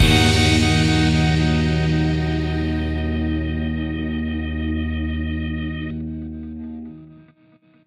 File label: modern indie rock hit 90